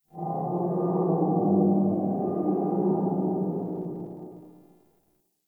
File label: Exterior Distant Calls Angry, Ambience